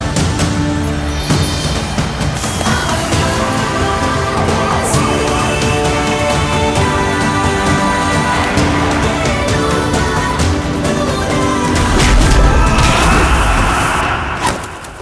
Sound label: fight music; vijay